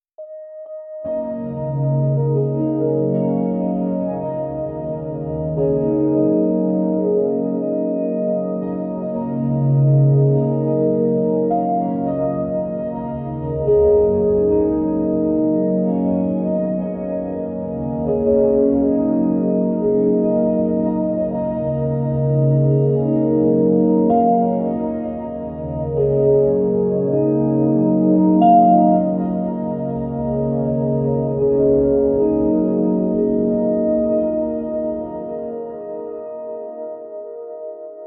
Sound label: melancholic intimate soft, ambient textures